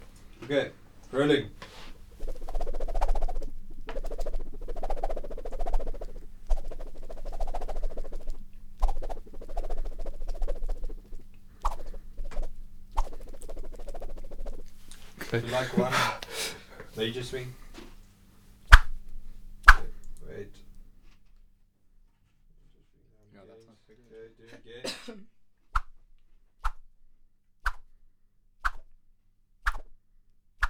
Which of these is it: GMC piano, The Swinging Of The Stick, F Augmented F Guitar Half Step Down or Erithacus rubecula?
The Swinging Of The Stick